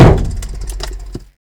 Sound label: impact wall tap; Kart